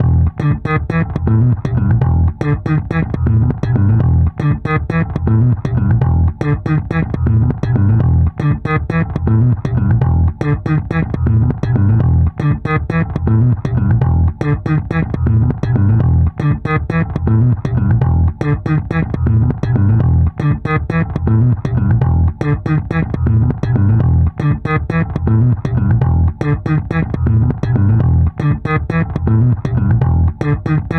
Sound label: bass slap; manabu murai works